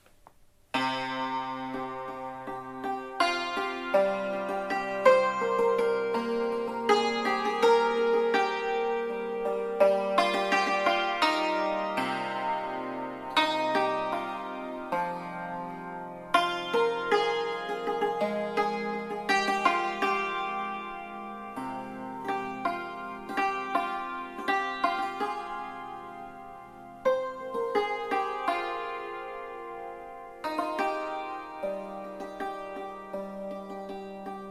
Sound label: santour